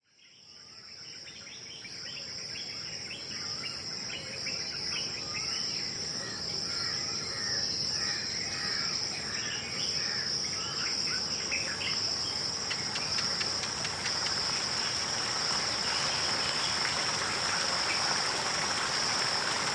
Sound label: soundscape sunday mroning nature sounds; matrimandir